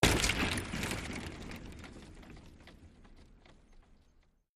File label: Hockey Glass Hit